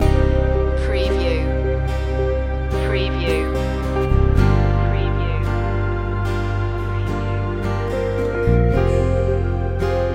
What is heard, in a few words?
landscape colors loop